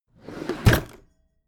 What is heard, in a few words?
packed drawer smooth open heavy